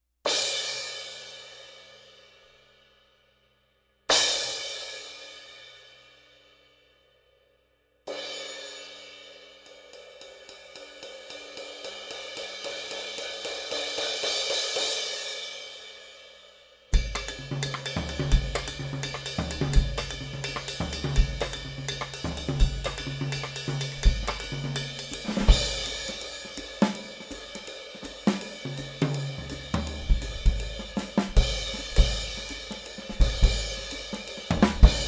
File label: Sabian Proto AA